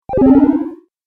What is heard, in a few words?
Classic Lo-fi Magic Jump, video game sound effects; Twistedtracks